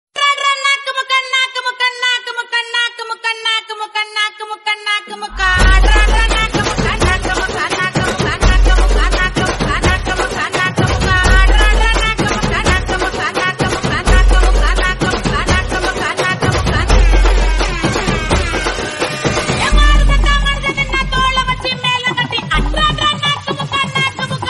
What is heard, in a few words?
female version tamil